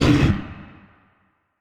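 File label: ogre lord damage up, monster; lake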